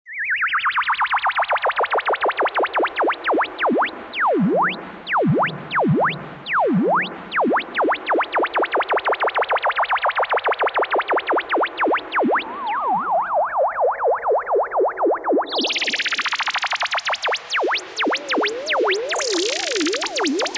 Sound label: analog; Universal Sound Bank; Soundscan 14 Twisted Special FX